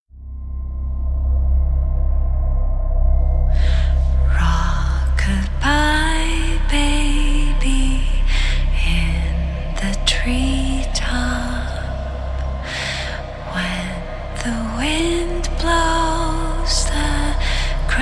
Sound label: Intense Female Vocal